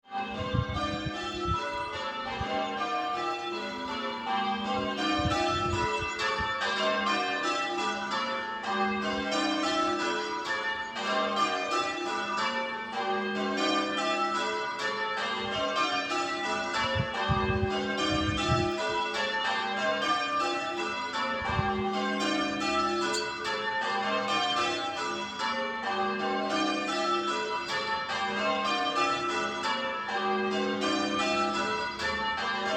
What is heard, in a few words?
bells; Bredgar